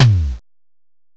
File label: SYM TOM; DRUMS; M8; CMI Factory